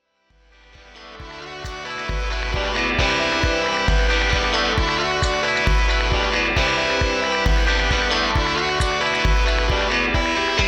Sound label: guitar loop 134bpm